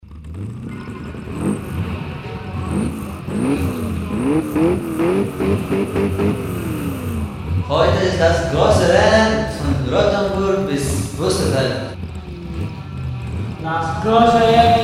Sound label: jingle; rennen rottenburg